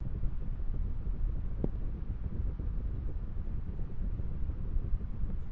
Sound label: mask fan